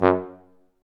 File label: F TUBA, Solo Brass, Tuba stacc